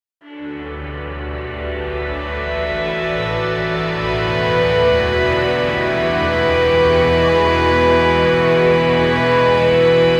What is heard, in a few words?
cinematic asian inspired